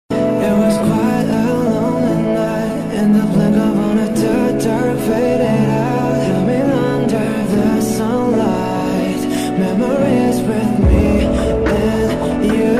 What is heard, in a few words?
Korean ringtone